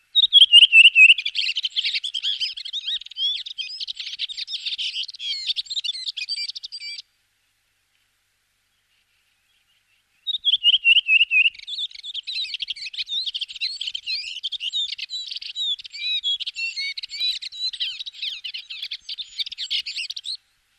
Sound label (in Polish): droździk - jeszcze inny przykład śpiewu; wroblowe; turdidae; turdus